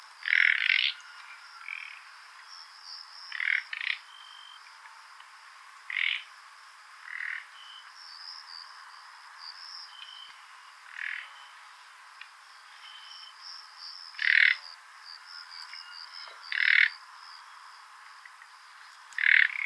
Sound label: call, Nightingale, Background: rooster call.; luscinia megarhynchos